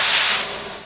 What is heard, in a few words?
Korg N5 Rock Kit C#3